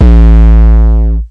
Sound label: Drop Me 808; drums; single hits; Khezie 808s